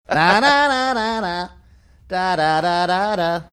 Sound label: singing portion of song